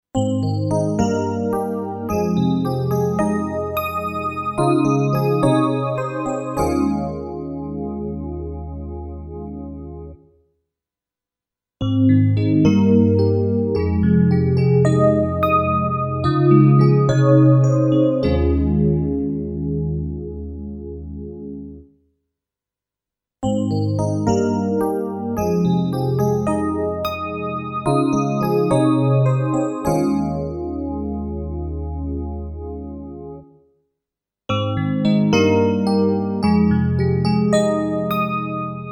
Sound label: E Piano